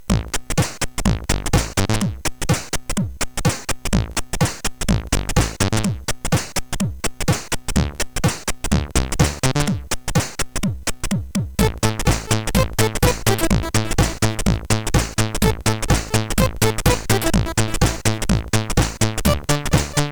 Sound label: Dusty Metal, CSG8580R5; Early Tunes